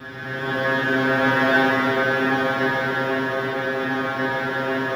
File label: SLOW STRINGS